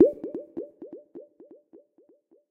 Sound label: continue Button Click; berry burst